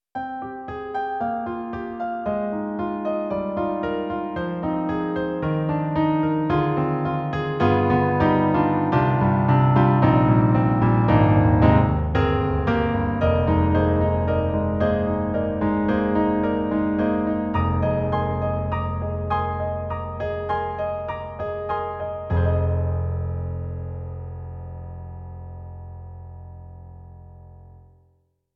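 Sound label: Roland SonicCell Hall Concert, piano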